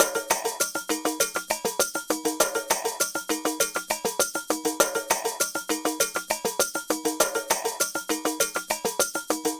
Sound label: Ensemble, Latin Percussion